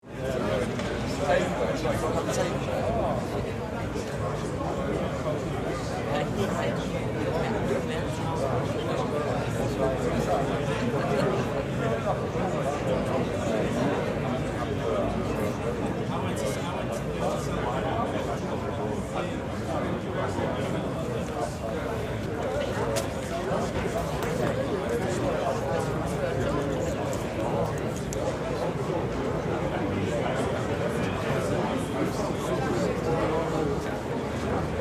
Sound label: Restaurant ambience busy crowd